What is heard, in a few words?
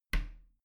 bathroom cabinet creak close soft; objekte; tueren